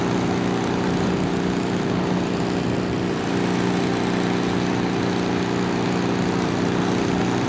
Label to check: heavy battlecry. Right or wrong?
Wrong